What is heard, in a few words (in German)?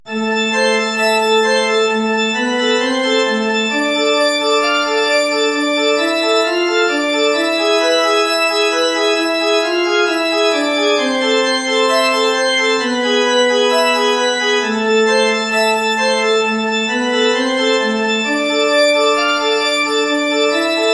Allegro (Orgel); Giuliani